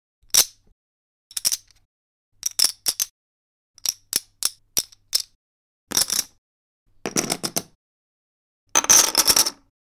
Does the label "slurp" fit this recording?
No